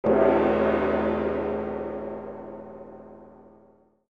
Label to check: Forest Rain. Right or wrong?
Wrong